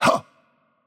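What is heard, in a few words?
Attack Light, Czech; bloom minigame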